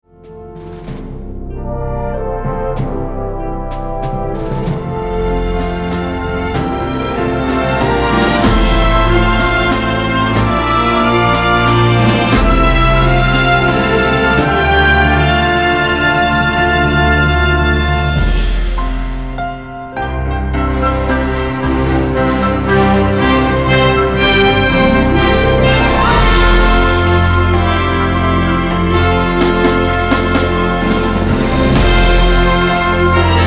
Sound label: Orchestral